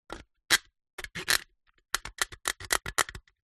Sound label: soda can empty cut with serrated knife; zapsplat; foley